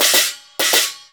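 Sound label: CHINA LG8 R, China cymbal; Spectrasonics; Heart of Asia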